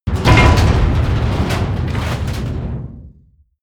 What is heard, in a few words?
hit metal